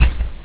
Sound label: dropobject; openrsc